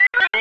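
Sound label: sphere blips medium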